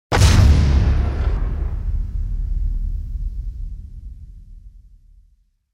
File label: weighted hit big